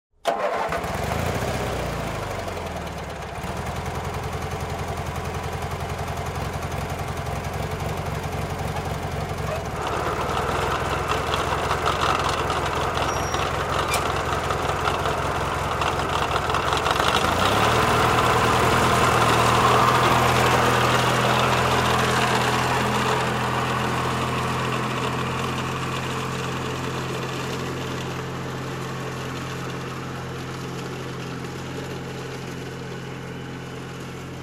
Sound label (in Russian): travu kosjat gazonokosilkoj